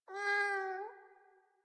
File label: Kitten Meow Playful; Warframe; Catbrow